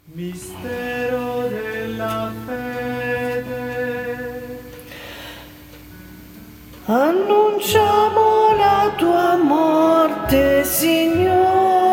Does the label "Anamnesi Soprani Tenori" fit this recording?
Yes